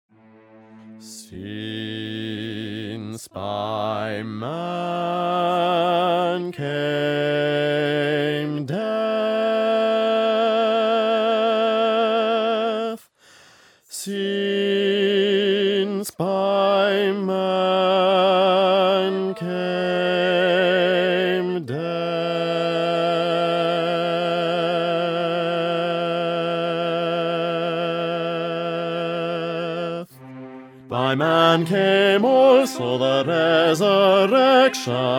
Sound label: bass, messiah